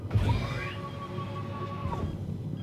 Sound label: Door Open; trek